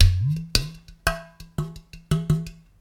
Udu Loop Dry

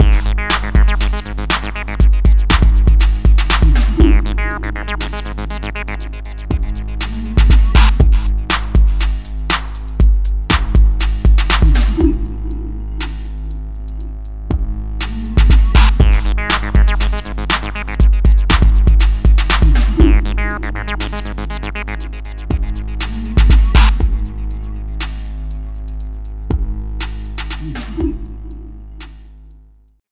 Outro

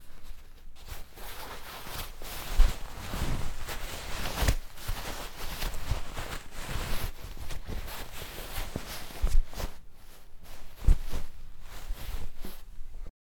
Bedsheets Being Ruffled

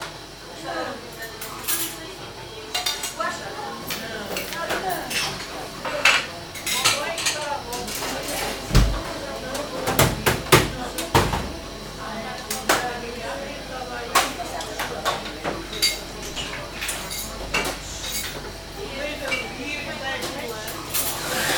Pastry Shop Ambience

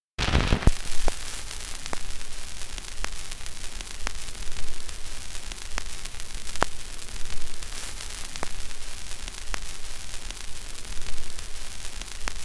78 Rpm Vinyl Noise

